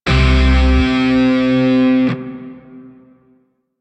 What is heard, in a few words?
Shawzin Pent Min Chord L; Grineer; DE; Warframe